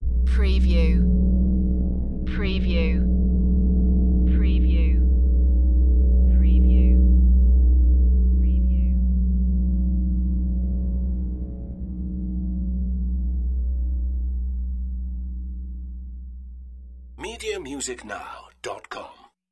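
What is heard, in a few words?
Evil Low Buzz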